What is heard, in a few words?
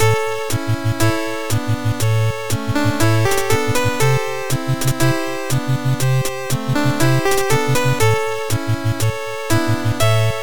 upgrade